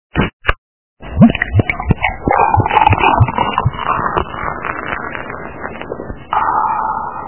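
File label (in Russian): питьё пенного со вздохом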